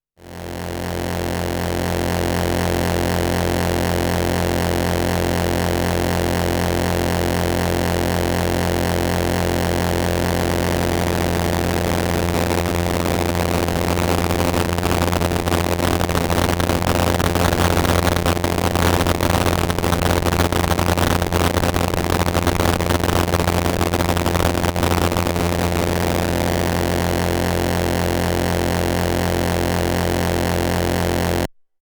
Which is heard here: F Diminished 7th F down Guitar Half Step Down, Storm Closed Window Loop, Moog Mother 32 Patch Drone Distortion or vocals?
Moog Mother 32 Patch Drone Distortion